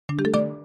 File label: Text Message